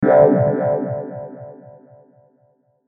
Hit Pulse Filter Delay; Blastwave

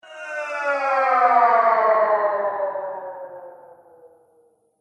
Strange Falling Horror Sound; Scary Soundscape Sound Effects; Andrew Fleming

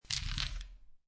Dice Grab Collect Five Dice From Felt; Casinos

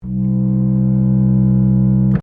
ORGAN PUMP BASSOON C4, Old portable church organ; Keyboards (Sound Effects:Musical)

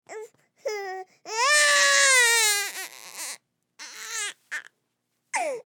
Human Baby Cry; Babies (Sound Effects:Voice)

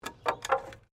Wood planks debris; Materials

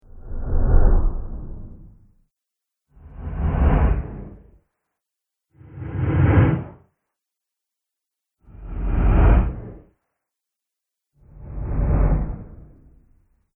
Low Passing Air Swooshes; Whoosh Sound Effects

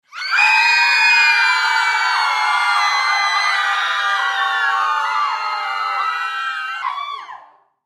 Human Crowd Studio; Crowd Reactions Scream Ahh Long; Screaming; Sound Effects:Voice

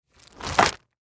Trash Bag Unroll; Household; Garbage; Blastwave

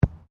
SOCCER BALL BOUNCE GRASS; Soccer ball sounds; Sports

